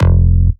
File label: Bass Shot F; Mutekki Media; Vengeance Electroshock Vol.2; Bass Shots